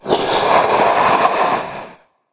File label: slith dead; sinfar haks; sf effects